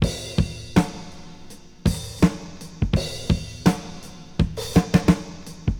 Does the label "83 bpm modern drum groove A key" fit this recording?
Yes